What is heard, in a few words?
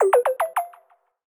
Charging Started Fast Fun, ui; Samsung; Galaxy A26 5G